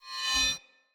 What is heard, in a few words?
Spear Whoosh Accent Heavy; Weapons; Player